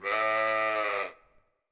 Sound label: City Ancient Small Sheep; Ambient; Civ4 warlords